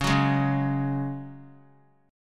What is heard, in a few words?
Db Suspended 4th Db; strummed